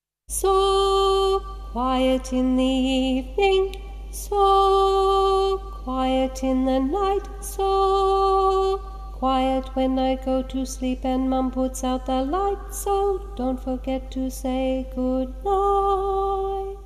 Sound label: song